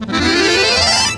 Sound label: ACC TRILLER; Best Service; World Colours